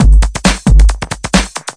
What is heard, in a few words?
135 bpm, electro one five, drum loops and breaks